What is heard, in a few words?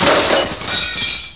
breaking glass